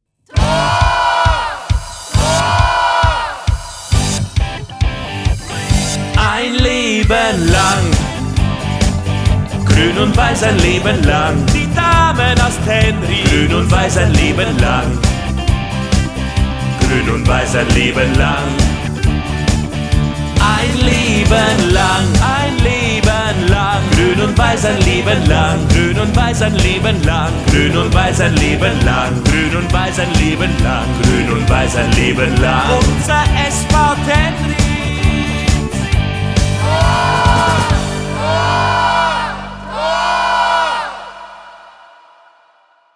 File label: Jingle; SVT; Damen FM